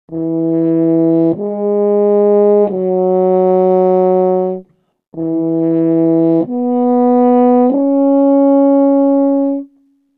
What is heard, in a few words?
95 french horn, Orchestral arrangements, 95 E maj